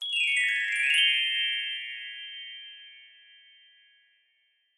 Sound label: Orchestra Bells Magi, mallets bell